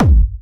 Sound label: Medium, kicks